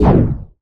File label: Beam Stop; Dystopia; injection